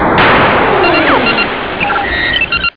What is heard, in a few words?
docking sound; 11 kHz; FF 0.3.4; Games⁄Arcade; FF Sounds